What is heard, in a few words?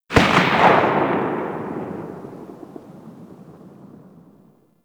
Ogris Tail, weapons